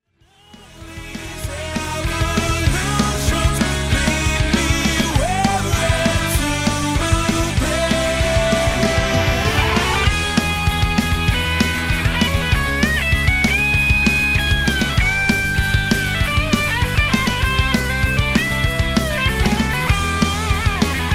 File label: guitar solo